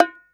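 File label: Bongo high; 300 Drum Machines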